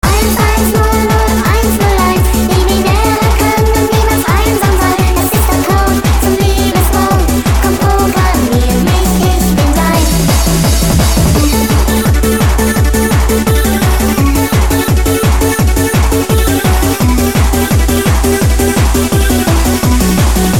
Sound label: happy hardcore